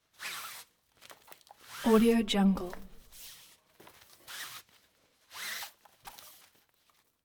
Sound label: rope pulled high freq; collanderson